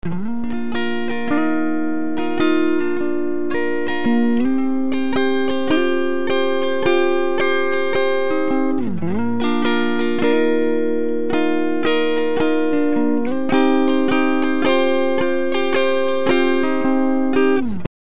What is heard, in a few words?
Pitch Shift